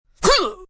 Grunt Strain, Grunt, groan, impact, male; Groaning Sound Effects

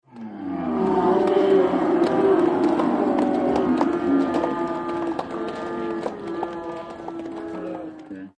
Cheer Football crowd Exterior, medium size cheer; Crowd Sound Effects; Voice

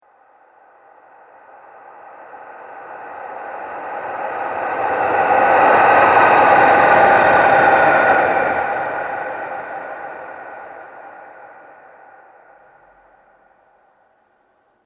Ghost Of The Cosmos; horror; Ghost Sound Effects

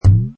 Cartoon Boing, Good upward bending bounce sound; Comedy/Cartoon; Boings; Cartoons

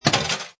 Pinball Sound Chunky Clank With Follow Up Clanks; Video Game Sound Effects

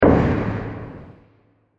Deep Wood Hit With Noise; Impacts; Blasts

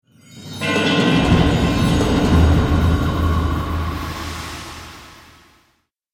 Drums Cinematic Stinger; Sonic Logo

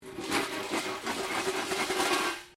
Metal rubbish bin lid spin concrete

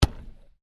Football bounce wood, Soccer Ball Bounce On Wood; Sports; Stuart Duffield

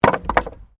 Wood Drop

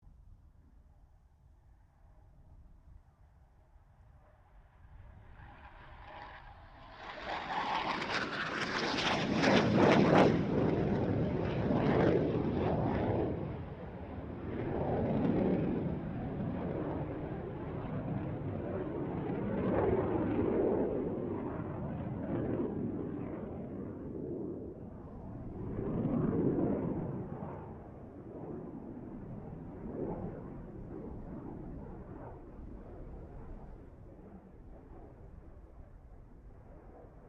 BAE Hawk 127 pass; Airplanes (Sound Effects:Vehicles)

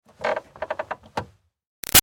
Car Highlander Parking Brake Pedal Down; 2005 Toyota Highlander V6; Creak; Cars; Vehicles; Big Room Sound

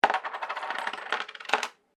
Coins Dropped Wooden Surface; Common coins; Money; Objects

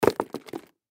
Combat Boots Drop Cement; Shoes; Foley; Objects; Blastwave

